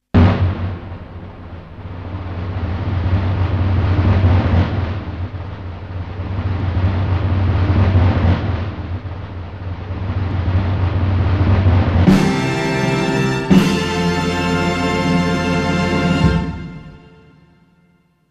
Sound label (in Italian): rullo